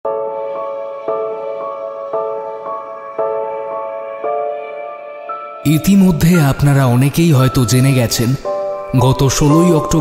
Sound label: Suspense Ancient Mystery Forest Horror Adventure